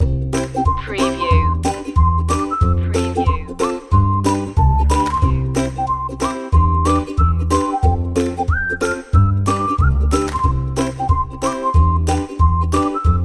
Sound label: reggae happy loop